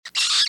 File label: Squeak; Cookie Jam